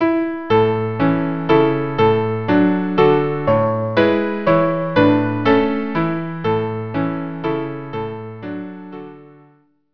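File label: violin piano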